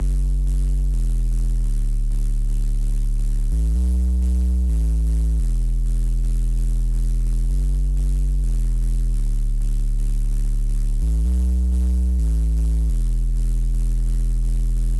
128 BPM F# Min Sub; House; Drop Loops; Cymatics; Glider

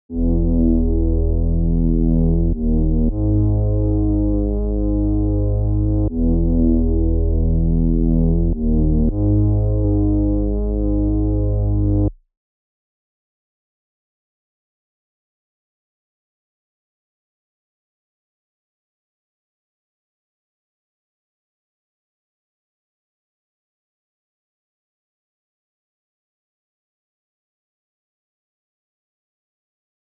Bass, 160 BPM G# Min; Melody; Loop Stems; Cymatics; 2022 Melody Collection Platinum Expansion - Pt 2; Stories

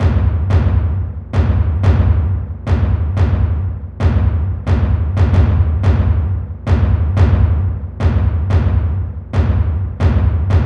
XL Taiko - 90BPM; Percussion Kits; STEMS; Ghosthack; SkyRealm; Kit 13 - Leap Of Faith

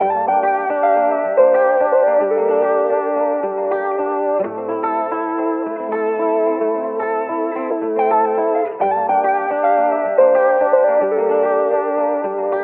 109 BPM D Min Guitar, Afrobeats; Melodies; Loop Stems; Cymatics; GHOST (Beta Pack); Bembe

